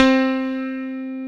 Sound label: ELEPI C3